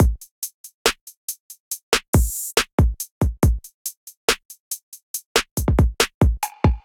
Kit, Trap Drums; Traverse Kit; Ableton; Factory Packs; Trap Drums by Sound Oracle

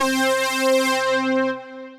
Big Saw Lead, Synth Lead

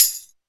tamb; Drums; Ableton Foundation FreePacks

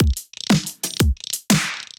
Nectarine Kit, Drums; Ableton; Drive and Glow

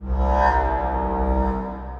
Wind Harp, Distressed Strings; Instruments; Ableton; Mood Reel

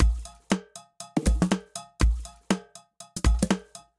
Sewer Drums; MPE; Ableton; Factory Packs; Lost and Found

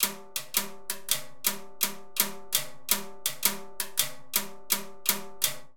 Danzonete Timbales 166bpm, Latin Percussion; Ableton; Factory Packs

